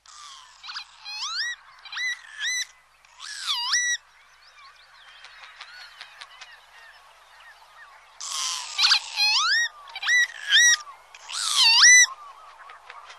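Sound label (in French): Vanneau huppe, oiseaux; birds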